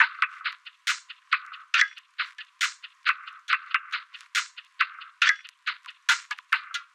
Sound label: Holded Perc Loop - 138 BPM; Cymatics; Fiji Hihat Loops & Percussion